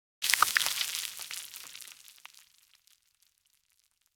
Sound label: loud sizzle popping oil